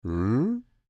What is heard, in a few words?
Creature Speak Groan Short, Human; Groaning; Big Room Sound